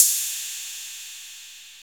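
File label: 808 RD, 808 ride; E-Lab; X Static Goldmine 1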